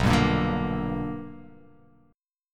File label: B Minor Major 7th Sharp 5th, chord